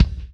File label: Kick